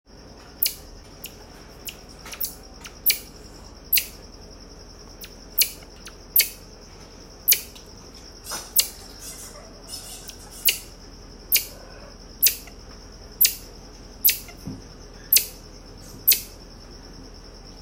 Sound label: Marmoset, Marmoset Monkey